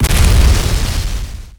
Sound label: Comet Hit; Nicktoons Nitro; arcade